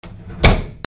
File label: DOORS